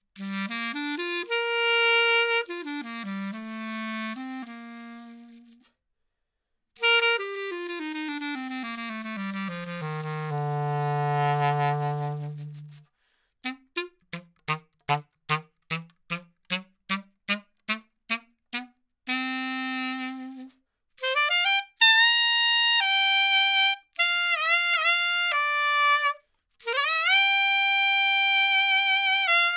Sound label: Clar Bb; 20cm; Bell